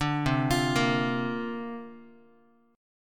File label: C 7th Suspended 2nd Suspended 4th C down Guitar; C7sus2sus4 arpeggiated